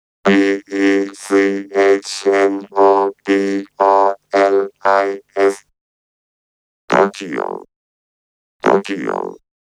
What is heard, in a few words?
Vocorder